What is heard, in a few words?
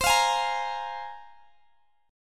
C 7th Suspended 4th Sharp 5th, C, down, Guitar, Open G